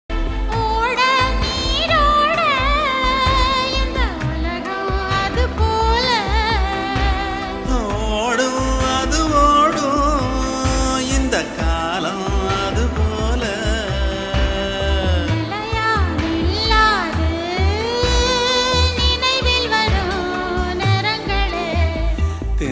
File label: tamil song 80s 90s